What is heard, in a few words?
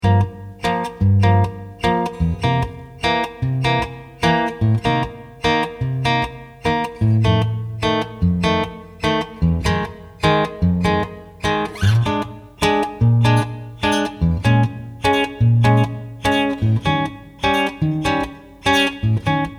II V Dom. becomes Minor starting on Gm7; Lick concepts